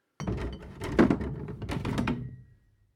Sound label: Rumbling Bottles